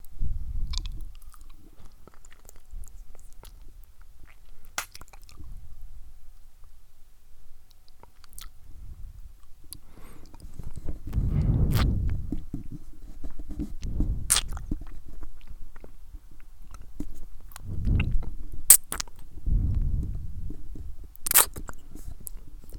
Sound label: Oral + Other Noises